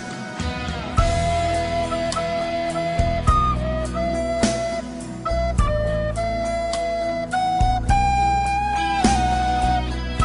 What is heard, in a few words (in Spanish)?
flauta desafinada